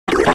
brain fart 8x speed

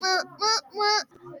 squeek

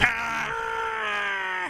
death

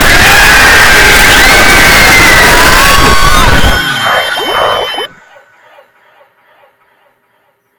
jumpscare

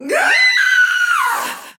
female veteran knight scream; soul calibur v

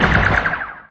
droid trifighter blaster; lego star wars

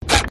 left foot

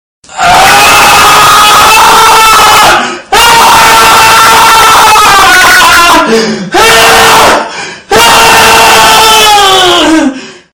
screaming

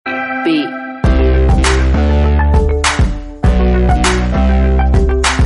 beat

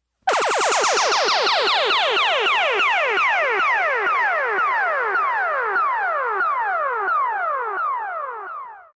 triple bubble

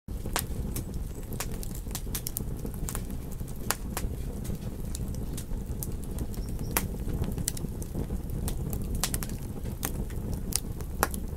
campfire

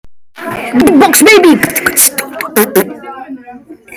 beatbox baby

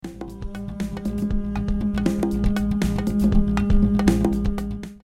jingle

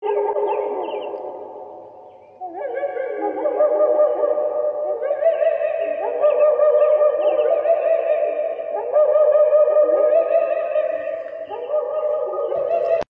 horror; spino

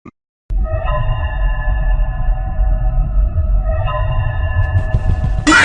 suspenseful music jumpscare

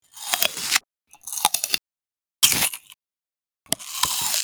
apple crunch

